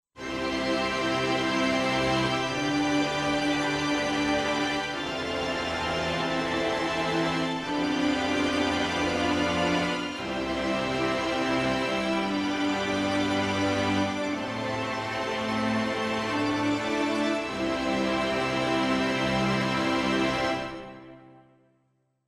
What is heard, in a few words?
Orchestral Colors Strings Ensemble; Lohengrin